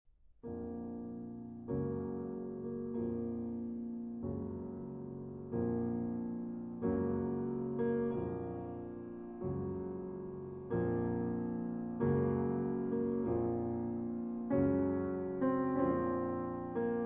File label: Sonata In B-Flat Minor Marche Funebre Lento